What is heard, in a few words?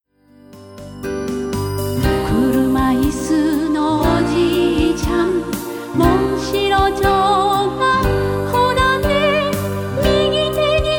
concert